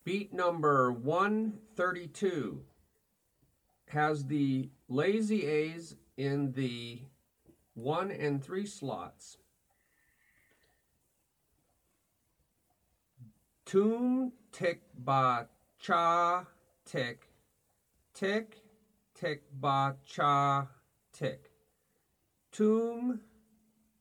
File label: Beat 1 a2 3 a4 Toom TiBaCha Ti Ti TiBaCha Ti